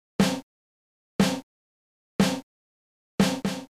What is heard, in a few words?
dpcm